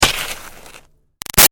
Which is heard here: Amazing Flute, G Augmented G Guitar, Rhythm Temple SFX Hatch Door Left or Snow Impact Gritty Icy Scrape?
Snow Impact Gritty Icy Scrape